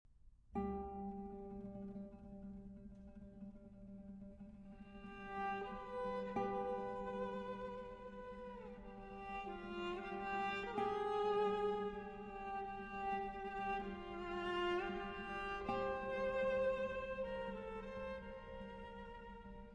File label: For Violin & Guitar; 2 Mélodies Hébraïques, M.A 22; No. 1, Kaddisch